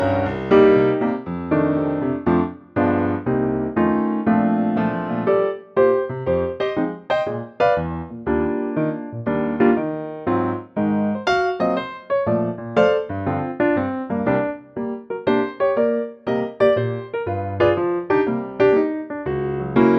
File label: Bb Piano; 2nd time through the feel goes to half tempo; key - Bb - vocal range - Bb to D#; Superb piano arrangement of the classic standard - bright in tempo then to a half time feel 2nd time thr